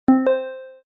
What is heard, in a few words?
roulette increase; games; poker; American Poker The Legends II